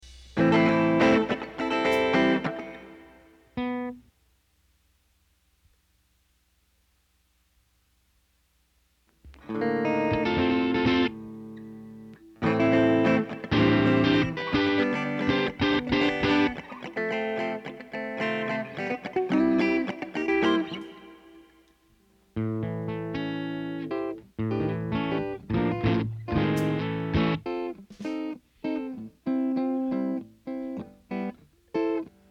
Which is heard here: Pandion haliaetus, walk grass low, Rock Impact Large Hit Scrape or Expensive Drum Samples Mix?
Expensive Drum Samples Mix